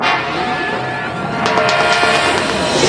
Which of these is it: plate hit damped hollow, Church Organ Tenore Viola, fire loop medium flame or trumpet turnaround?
trumpet turnaround